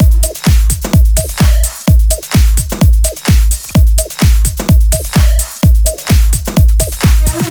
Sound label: 128BPM Dubwise Kit